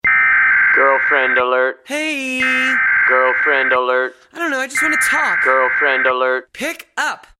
Alert; Girlfriend

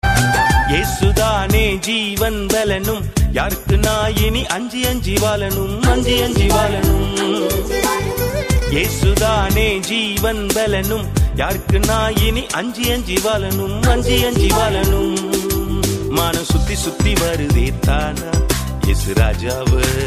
Tamil Christian Tone

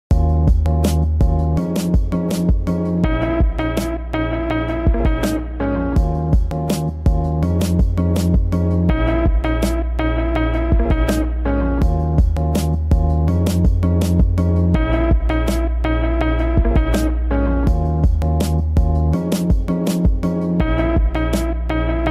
Chilled

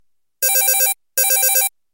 Phone

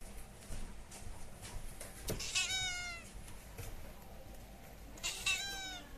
meow; Tia

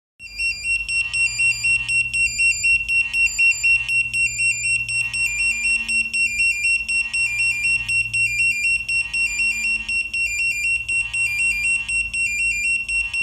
Lifeboat pager

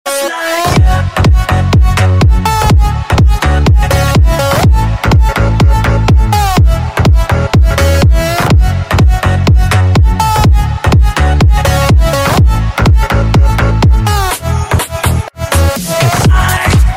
Remix

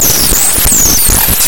Frog Motor; Insane

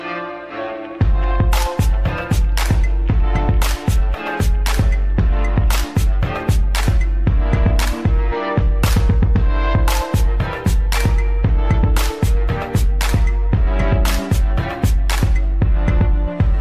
Hip-Hop

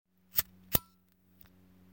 Bic lighter

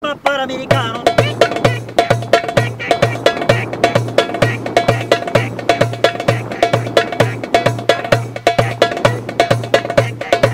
Amerikano Darbuka

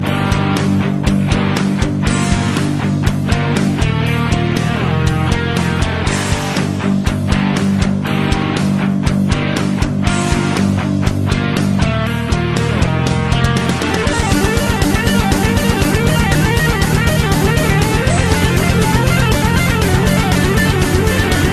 Metal Solo

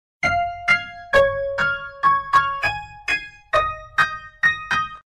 Reggae Sms

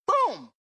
Boom; Jar Jar

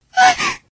Gasp

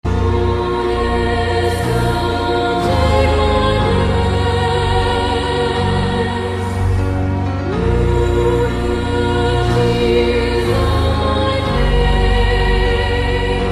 Funeral Cry

